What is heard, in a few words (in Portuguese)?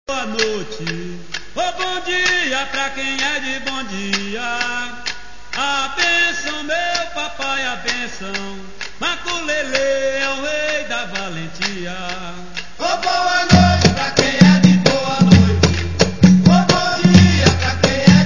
toque maculele, capoeira; toques